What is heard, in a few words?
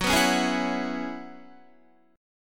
Gb 7th Suspended 2nd Suspended 4th, Guitar